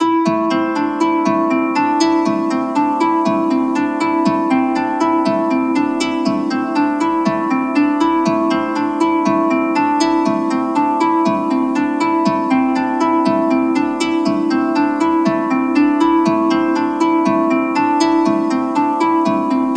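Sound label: Harp - A minor 120BPM; Construction Kits; STEMS; Ghosthack; SkyRealm; Kit 05 - Heart Of Fire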